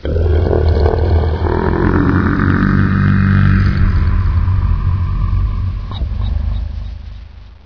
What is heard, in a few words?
attack; hl1; Source engine